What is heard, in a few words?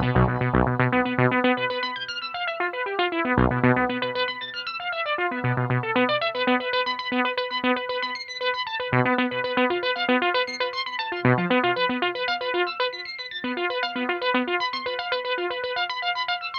Jazz